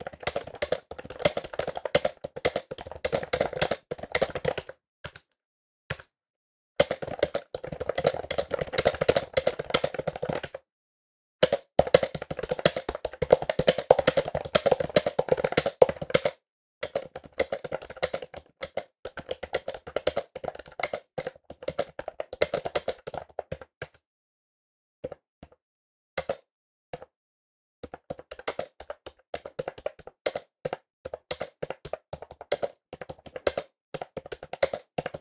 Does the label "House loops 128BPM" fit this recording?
No